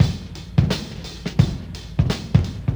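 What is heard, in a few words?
beats, roll off some bottom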